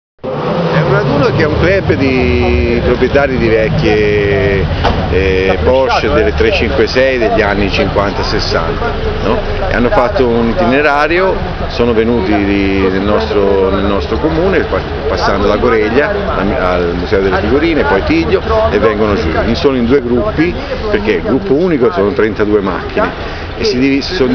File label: vintage porsche roar; 32 vintage porsche roar into barga